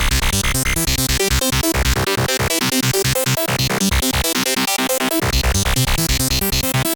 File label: synth